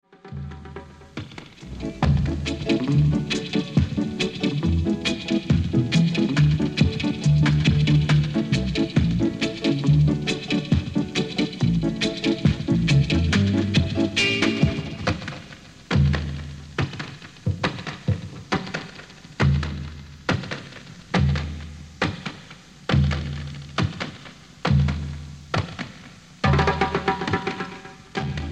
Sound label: Drum Call